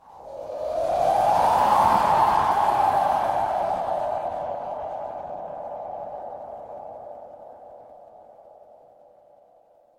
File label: horror wind whisper